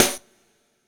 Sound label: SD, Dance Mega Drums; Best Service